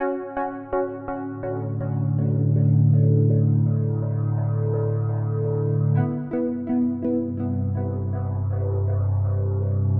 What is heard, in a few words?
Synth loop (120BPM); cinematic drama; Loops; musicradar